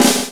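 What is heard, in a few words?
drum roll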